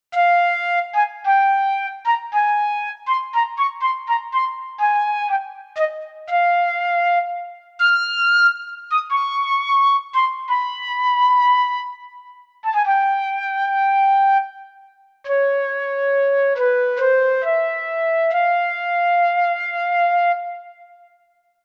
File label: Flute, Korg MSC-1S; Korg MSC-1S Variety